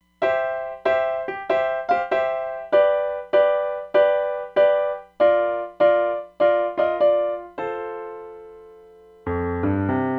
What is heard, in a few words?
Chorused Non linear room, Roland DEP-5; Factory Patches